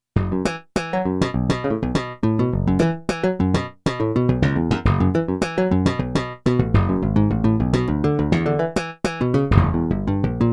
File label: El Bass